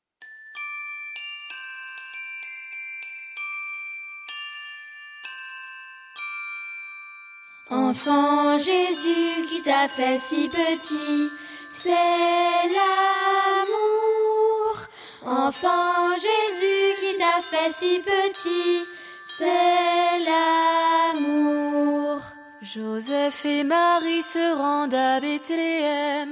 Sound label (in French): berceuse de noel